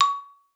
C#5, xylophone; Xsample VOL-4

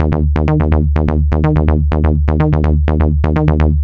Tech Bass; Sonic Foundry (Sony Creative Software); Underground UK House & Electro

